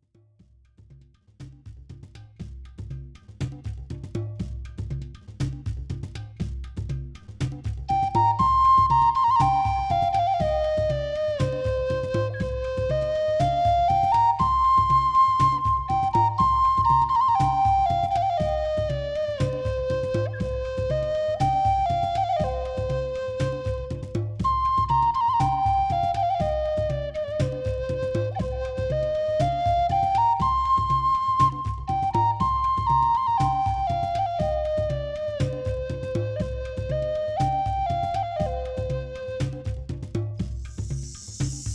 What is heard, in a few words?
flute 432 hz